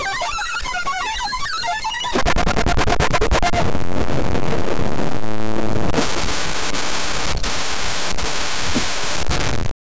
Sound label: click sound